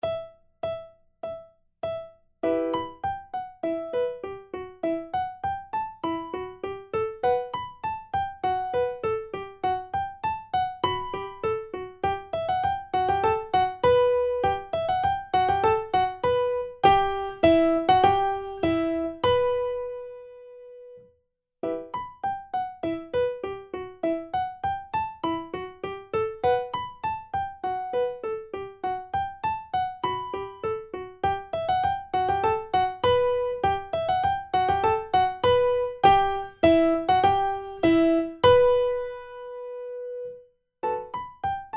Primo, Vierhaendige Uebungsstuecke; Playbacks; Diabelli

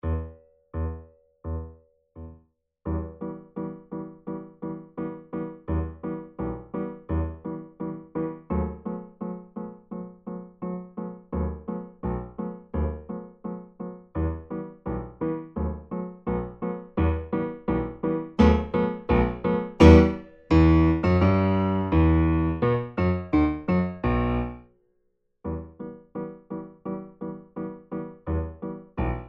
Secondo, Vierhaendige Uebungsstuecke; Playbacks; Diabelli

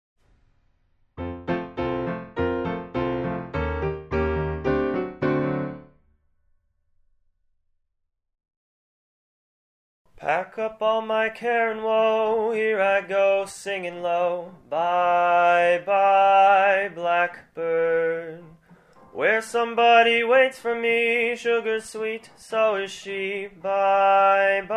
nur Gesang; Evergreens Und Alte Schlager

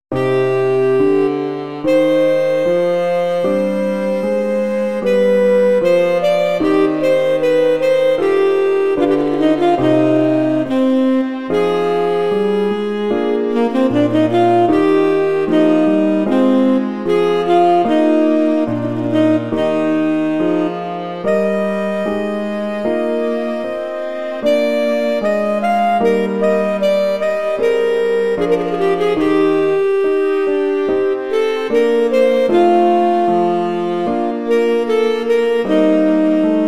Adagio mit Sax; Playbacks; Bach Johann Sebastian; Sonate 4 BWV1033

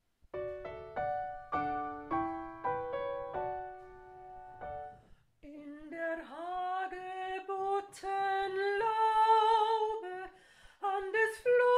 Playback Nur Gesang; Playbacks; Trost; Koessler

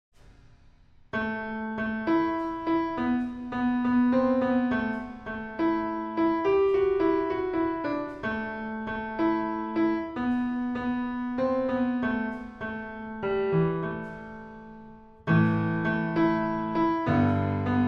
Irische Taenze

